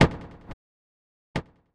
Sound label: knock